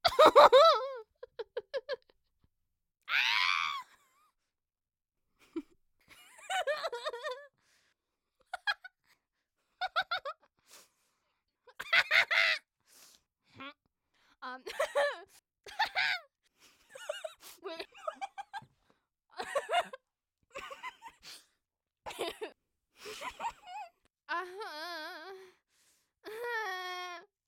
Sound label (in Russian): kompilyaciya zvukov smeha ot bistrogo vihoda vozduha do gromkogo hohota